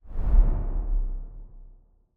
Dragon Flap, A pair of POWERFUL wings are flapping once. They are separated in stereo tones